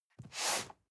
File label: cardboard box medium slide short; objekte